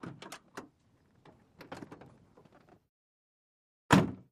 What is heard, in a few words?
Ambulance Door